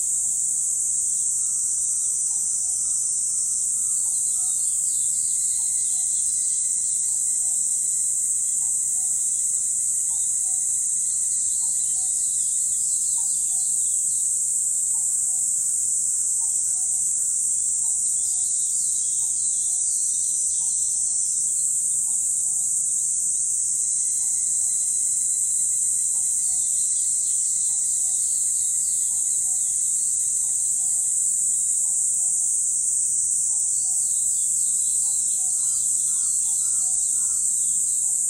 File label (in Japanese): カッコウ